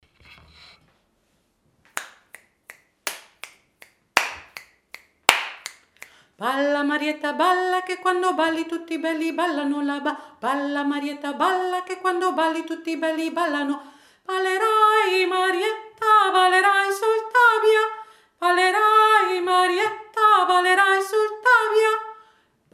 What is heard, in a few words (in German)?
marietta schnell